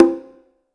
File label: dong; Konga; HitSounds; OpenTaiko